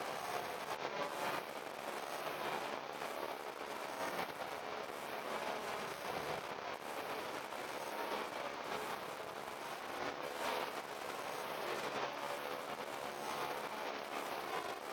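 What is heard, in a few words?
Ambience Static Loop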